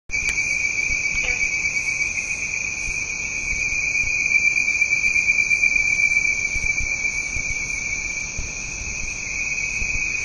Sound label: Hyla avivoca